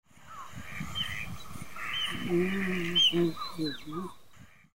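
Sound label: Mallard; magamo; hyogo; koe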